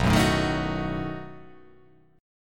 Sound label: B 7th B down